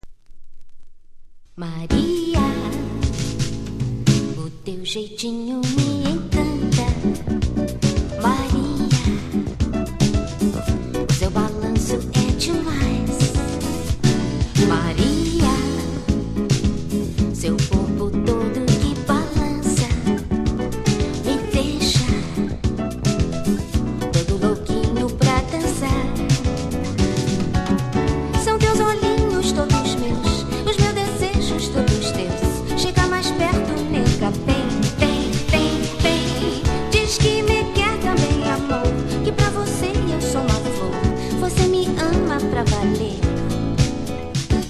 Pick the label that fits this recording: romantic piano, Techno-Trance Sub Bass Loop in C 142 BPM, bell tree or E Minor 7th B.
bell tree